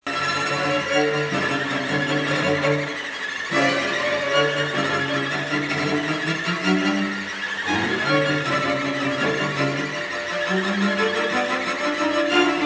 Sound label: Quartet; montague